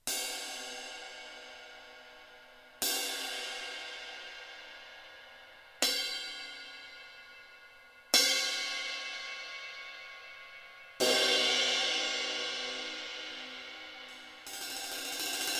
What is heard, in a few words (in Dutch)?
Classic Ride 20, ride bekken; Amedia; Original